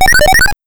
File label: upgrade; Mega Man; gamesoundopedia